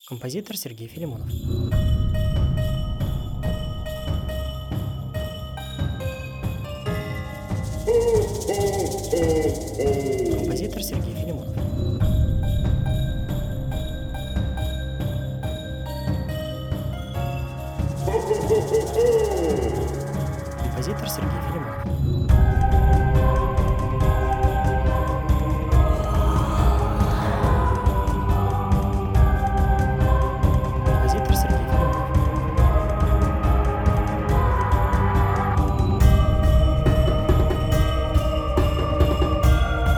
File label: Jingle Bells Halloween